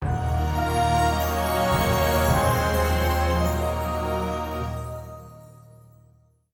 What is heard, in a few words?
Legendary Stinger; Firemancer Flurgi